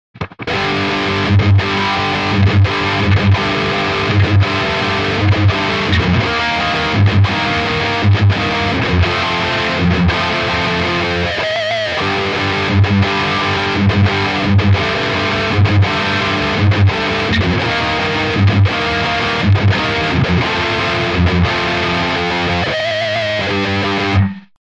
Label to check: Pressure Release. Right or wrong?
Wrong